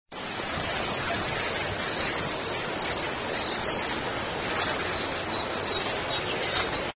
voz picaflor bronceado

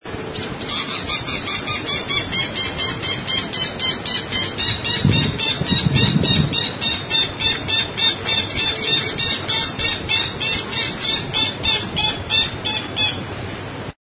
voz jacana

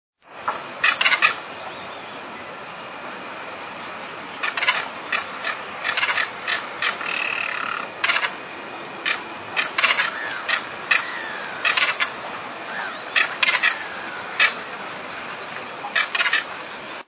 voz carancho

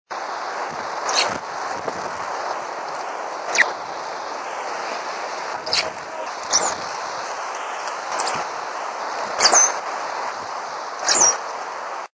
voz tordo pico corto

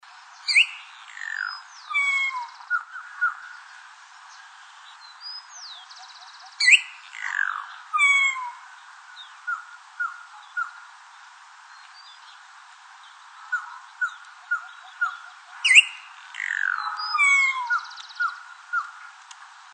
voz boyero negro

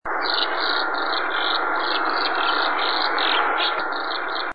voz golondrina ceja blanca

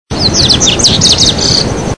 voz corbatita común

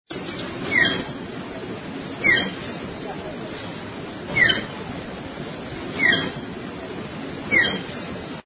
urraca, voz

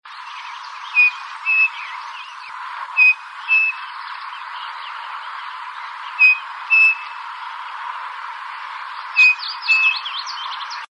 crespín, voz

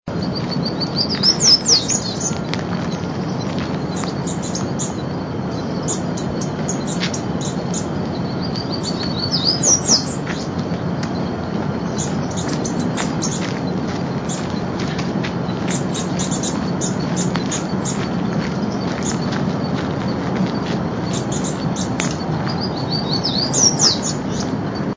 voz arañero coronado chico

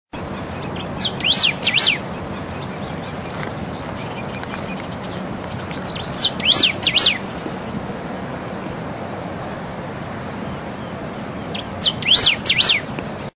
voz pepitero de collar

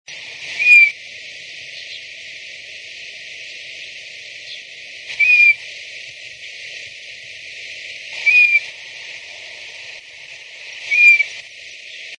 voz matico

